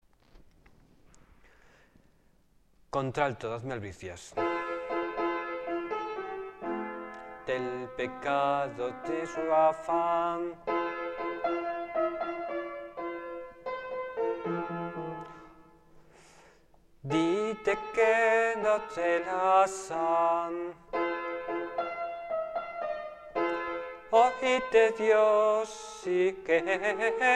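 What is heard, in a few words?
tenor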